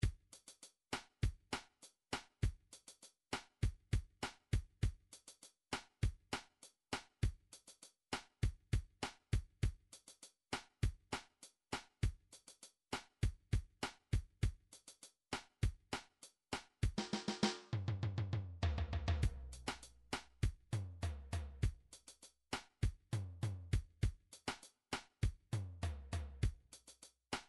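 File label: drums afro cuban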